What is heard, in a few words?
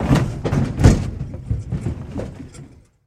Body Fall On Car; bodyfalls